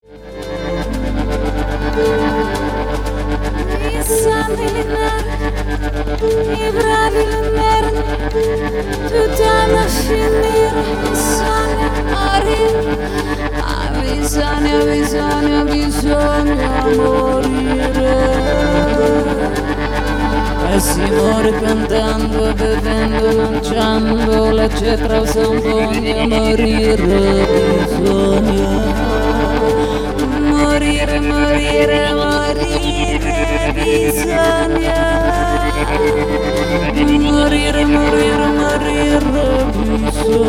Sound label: pasacalli